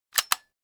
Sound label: gun machine gun foley; NCH Library